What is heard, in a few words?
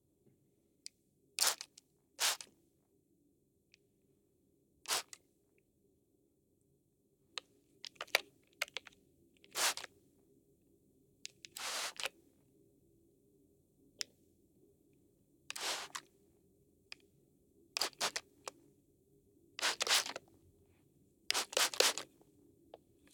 Sanitize; TheWorkRoom Audio Post